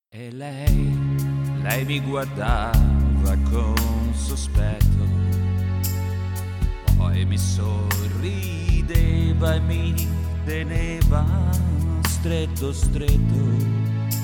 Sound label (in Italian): contralto